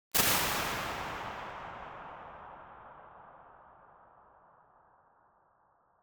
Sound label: DAMPED CAVE, Impulse Response